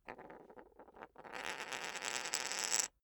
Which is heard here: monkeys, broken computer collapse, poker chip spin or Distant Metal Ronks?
poker chip spin